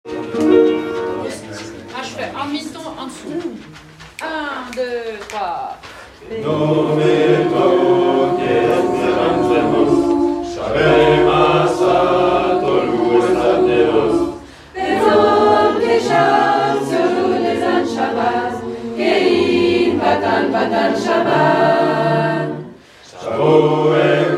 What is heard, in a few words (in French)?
Voix Tutti